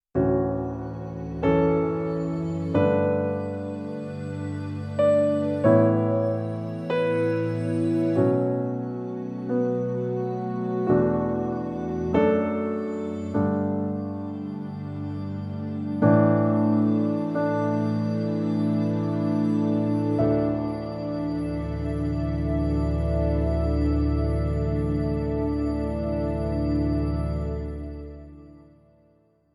nord piano 4 layer piano pad

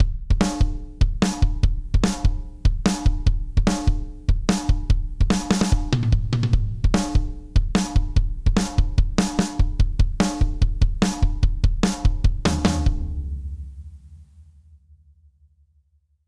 drums superfuse; chandler limited rs124

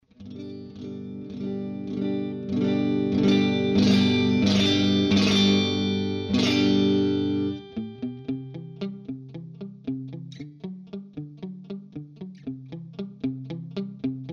strat 20db boost jtm45; vovox sonorus protect a350 ts-ts test; sommer

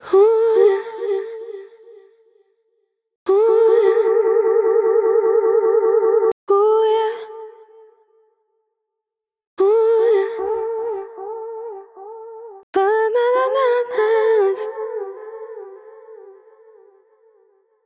delay; Delay-Plugins; acustica acqua lemon delay

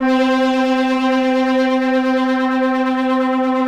synplant fm

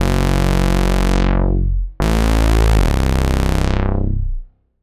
unison synth sound

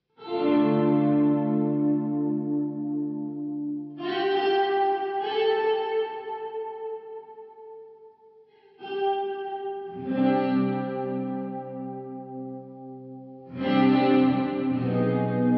hall blend full; KONG Touch My Hall; tb audio

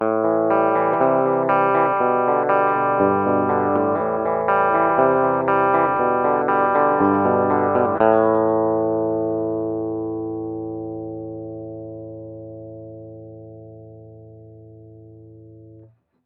bb-preamp clean; xotic testbericht

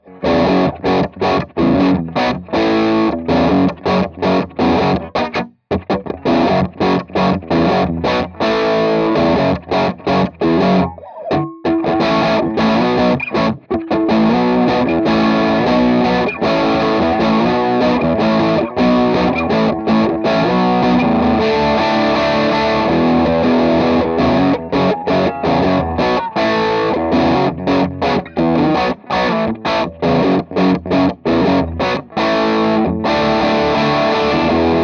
Celestion G12M crunch; laroqua 212 studio test

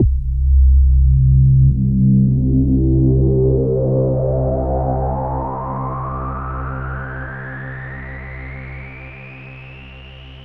filter rising stereo delay; arturia matrixbrute

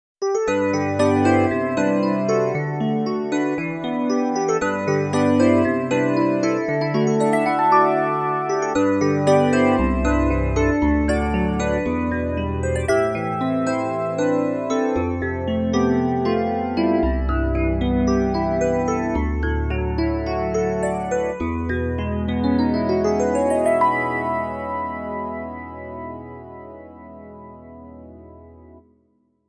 modern electric piano; kawai ca501

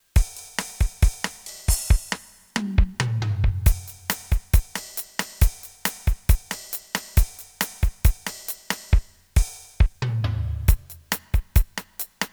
startone mk-200 beat latin

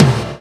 Tom

